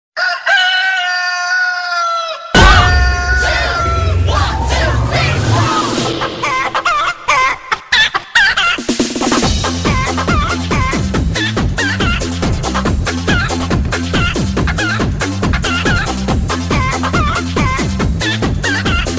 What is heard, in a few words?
Chicken Ringtone